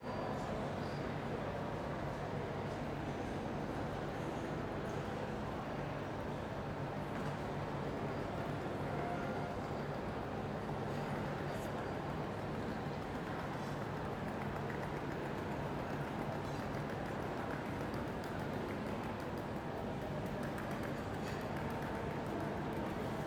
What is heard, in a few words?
train ambience